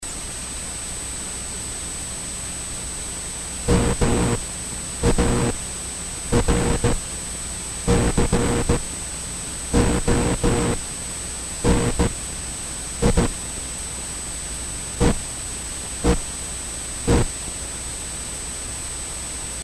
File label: spark; MARCONI